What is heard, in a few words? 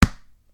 ball hit, basketball